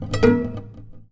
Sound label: Classical Guitar Double Note Plink